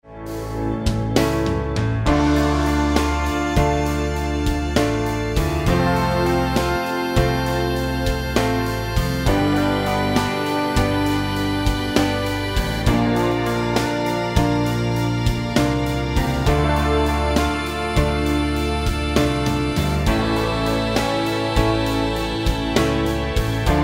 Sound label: Folk Blues Blues Backing Track in Dm